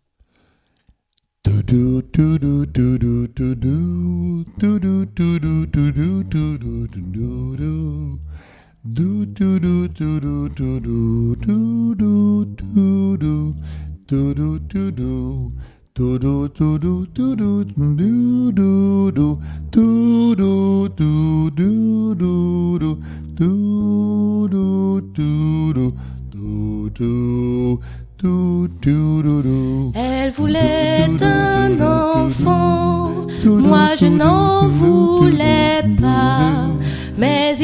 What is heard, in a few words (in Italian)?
Tutti